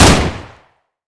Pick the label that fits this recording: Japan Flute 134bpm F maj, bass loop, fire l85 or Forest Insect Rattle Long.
fire l85